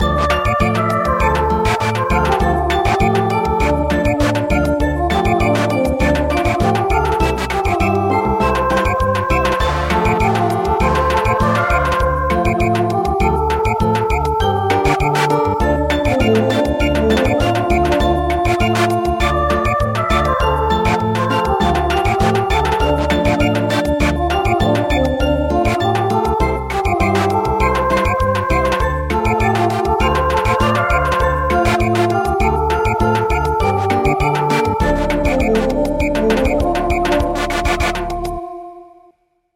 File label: Samba